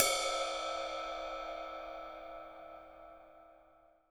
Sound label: Zildjian 24 Ride; RED ZEPPELIN